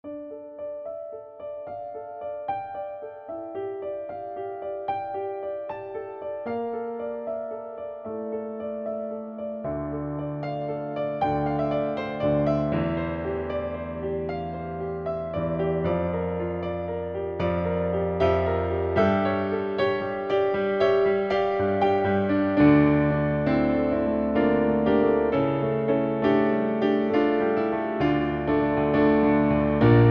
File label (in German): Es kommt ein Schiff geladen Klavier Version